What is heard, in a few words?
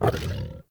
Wolf Jump Heavy, Vocals; Animals; Actions